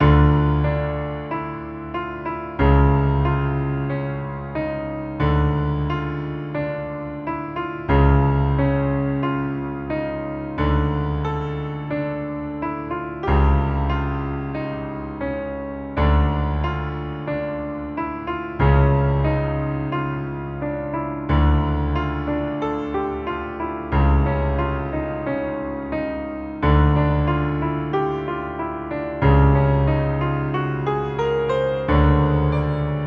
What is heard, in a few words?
Piano Dramatic